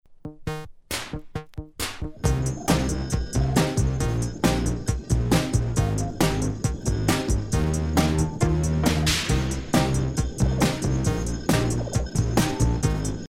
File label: synths